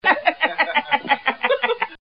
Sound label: laff